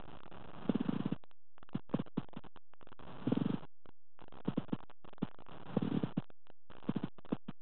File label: pleural rub, zvuky plic